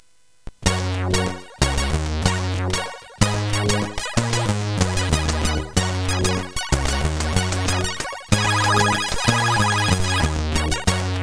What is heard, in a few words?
Match Rock, sid, CSG8580R5